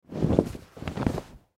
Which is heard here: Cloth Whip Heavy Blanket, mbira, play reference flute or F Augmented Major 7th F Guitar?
Cloth Whip Heavy Blanket